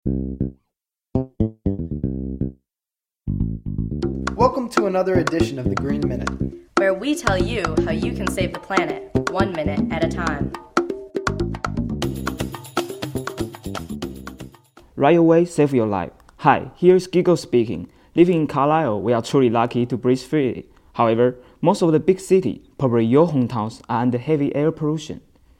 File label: giggle